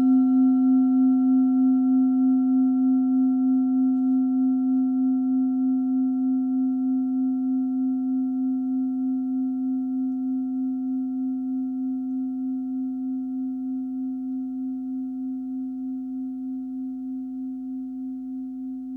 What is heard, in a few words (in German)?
klangschale tibet